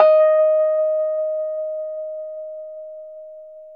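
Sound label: Rhodes, D#4, right; 3D Sound; 3D Keys VOL-1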